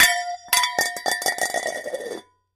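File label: Bowl Small Drop On Cement; barrels buckets & cans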